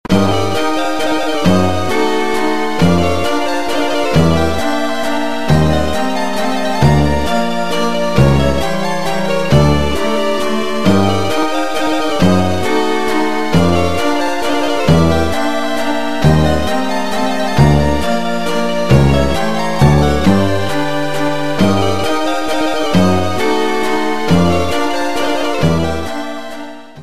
RPG風ファンタジー編 キャッスル城

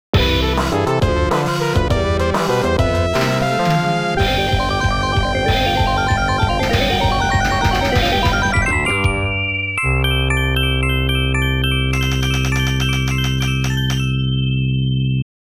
ラウンド間つなぎBGM; All Target Cluster

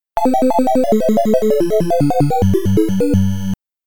ゲーム・スタート・ミュージック; Pac & Pal; Pac-Man; arcade

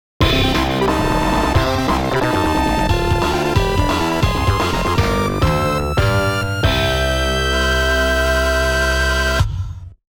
ラウンドクリア; All Target Cluster

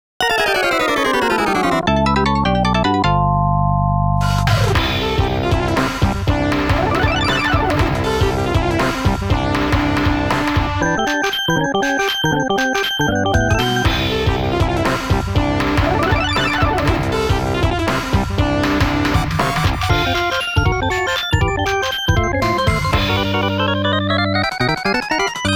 ボスBGM; All Target Cluster

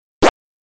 水たまり通過音

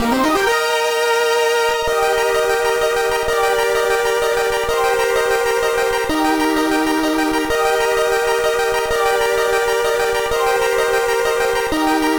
レベルアップ; Medarot Classics Plus

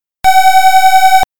予選スタート・サイン音

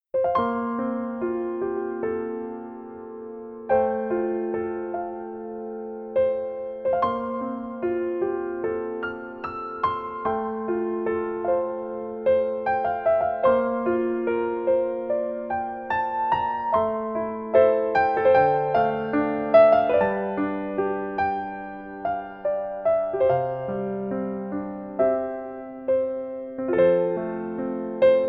星の世界 Piano Major Chord A; planetarian

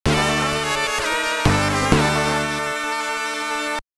ゲームオーバー BGM; King's Valley The Seal of El Giza

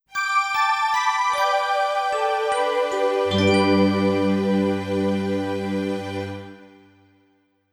ジングル -「キラキラ」; tokimeki memorial drama series vol.2 irodori no love song

